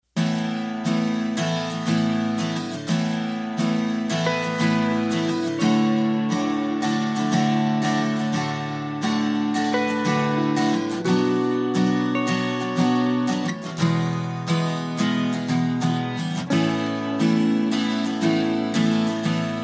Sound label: sweetest guitar